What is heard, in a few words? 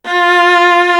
SOLO VIOLA; East West; Global Sounds